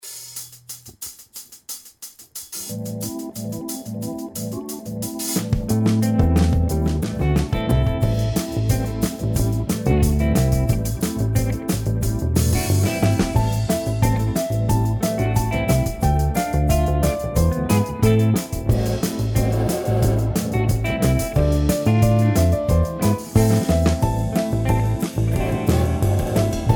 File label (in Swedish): Di Ban melodi